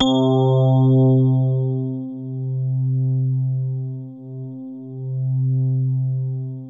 E RHODES; Best Service Advanced Media Trax Modern Composer

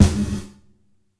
Gated Snare; Gated Reverb; Univerb; musicradar

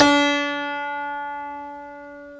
PNO, Tack Piano; Club-50 - Foundations Roland

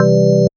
B3 Organ Chord; Big Fish Audio; OSH Chords

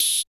Drums; Distored Reality

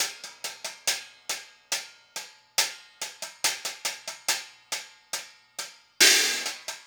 Hi Hat Pattern

